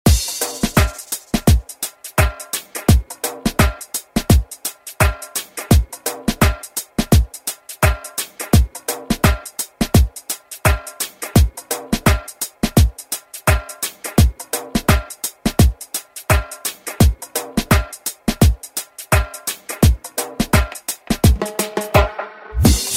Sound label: Re Drum